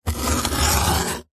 foley wood scratch with knife blade tip single short